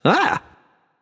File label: happy; smite; voicepack; osiris